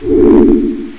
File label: tele, teleport; Quake; PainKeep